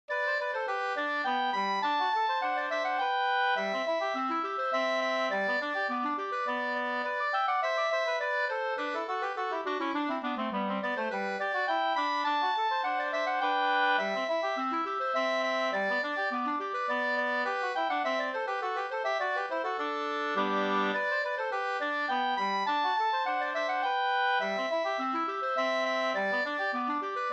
slangpolska

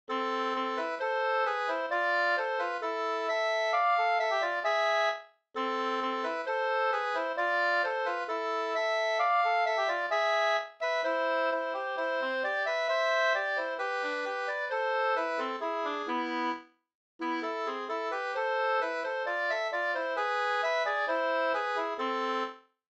vismelodi; Honsgummans visa

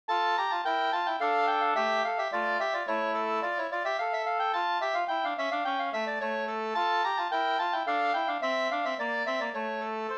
slangpolska 2st; med andrastämma av Olof Andersson

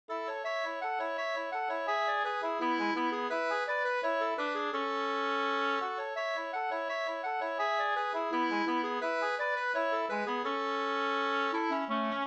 snoa

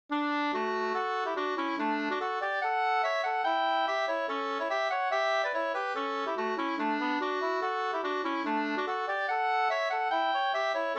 schottis Finsk schottis

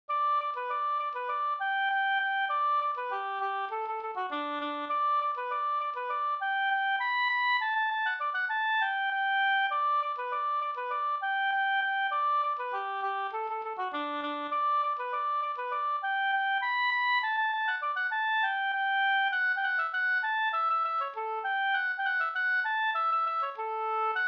engelska; e Adolf Fredrik Johansson; Runtuna

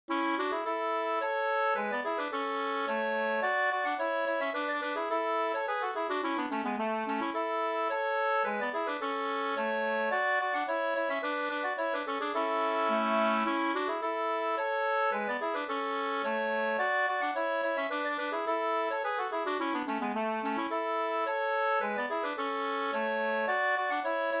slangpolska A dur 2st

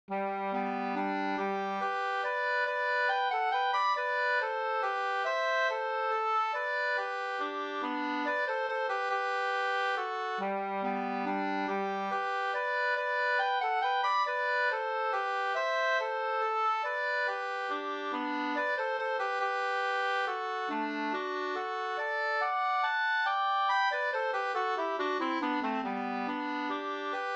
menuett; e Petter Dufva nr78 2st

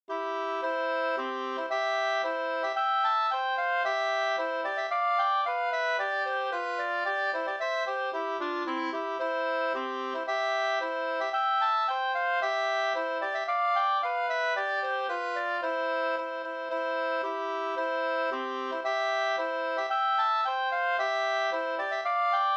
ganglat; Taxingemarschen Sd

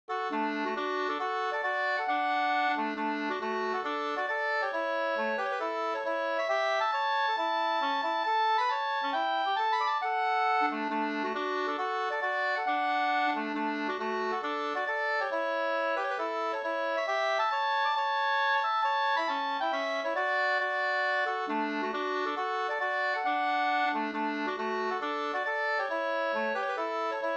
hambopolska

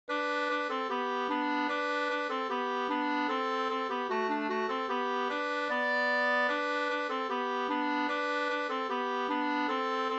schottis; Tala re Janne Sm 2st

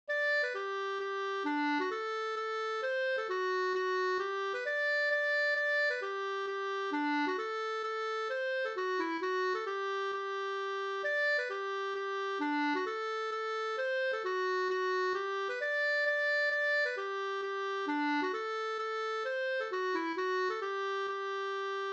polska

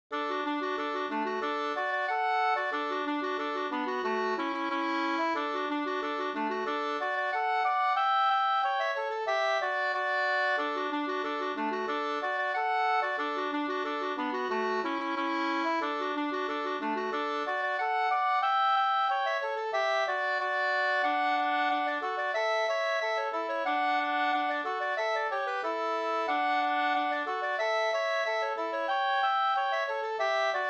engelska

